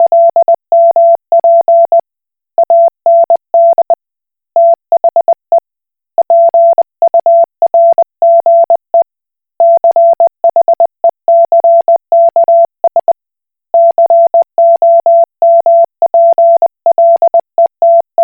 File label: Koch Morse